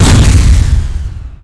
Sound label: Mine Explode; Finding Nemo; XBOX